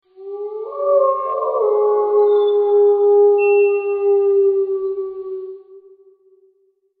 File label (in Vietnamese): am thanh ro rang voi tieng soi tru